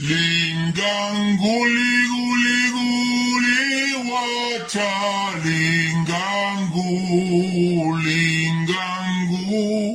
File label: ling gang guli guli slowed